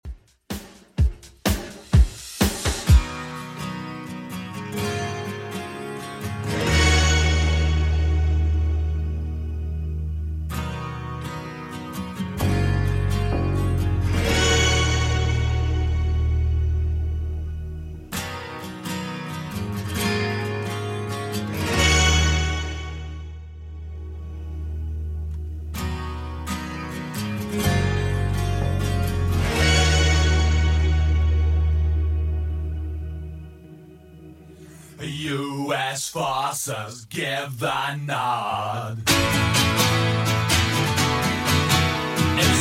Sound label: 80s Rock Redrum 128 bpm CLEAN